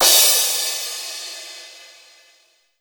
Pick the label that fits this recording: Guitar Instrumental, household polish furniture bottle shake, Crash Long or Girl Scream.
Crash Long